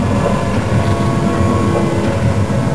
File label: tank turret